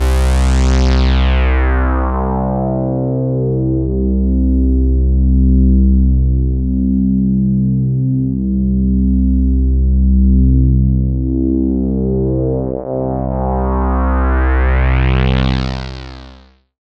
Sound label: pad C2, Oberheim OB-Xa